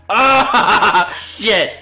ahahaha

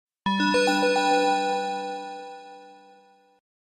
pink elephant toy bell ring

